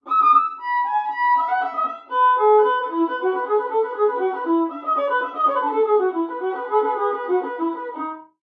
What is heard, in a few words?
Violin; Assassin's Creed Syndicate gamerip